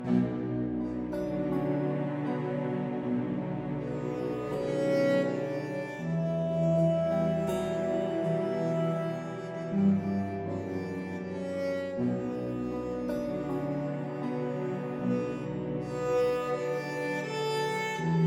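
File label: Music Layer Exploration layer; HDF Ch3 Explorartion1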